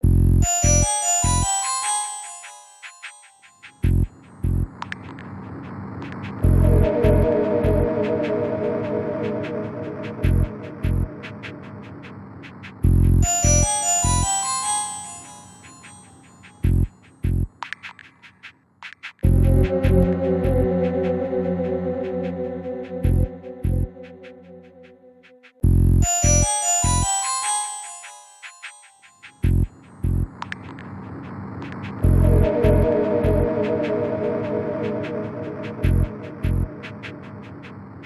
Cave; Adventure Time: The Secret of the Nameless Kingdom

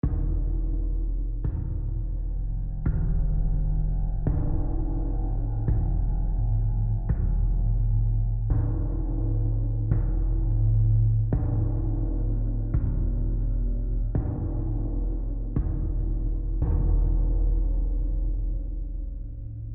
Tension Low; AB Tension 5 Low Block 2

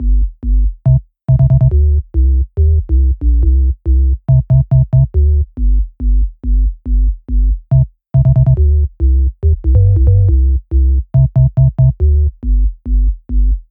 Bass

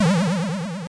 Illegal Move; The Chessmaster; Game Gear

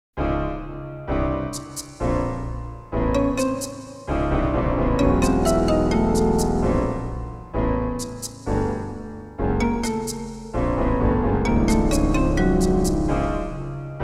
Cave; Arc the Lad II

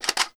RELOAD Pump stereo; gamerip; The Looker

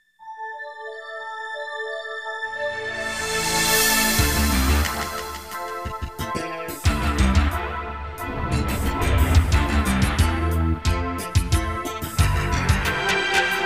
Midi; Salamander Portable; PSP; Xexex

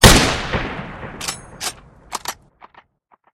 Sniper Shoote

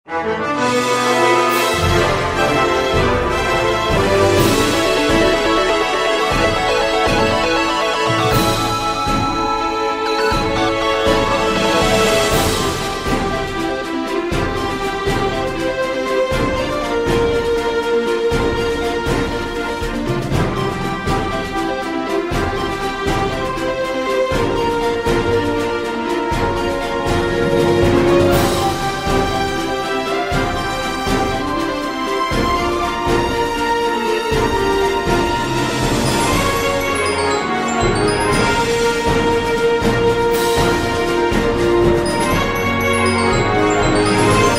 Victory Fanfare; Terra Battle

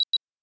Beep Mina; El Chavo: Una Feria en la Vecindad

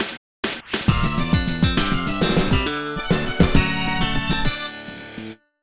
Level Complete City

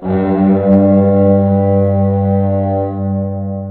Horn

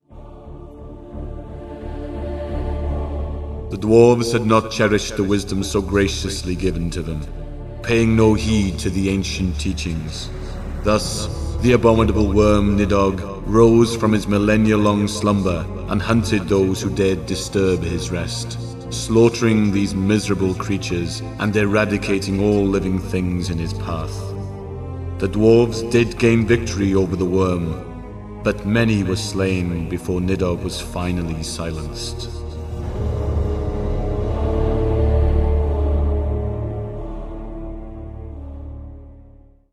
Mountain Clan Win; Disciples II Dark Prophecy Gold Edition

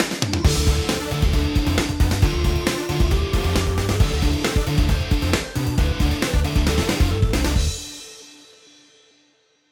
Inning

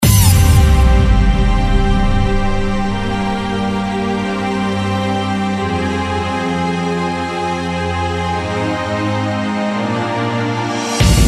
Airbass

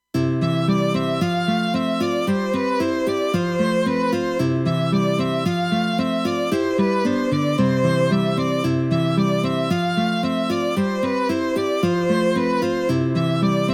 Acoustic; houchou shoujo gensoukyoku yume nikki gensou; Hello Nasu

